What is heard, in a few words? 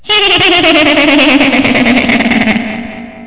small giggle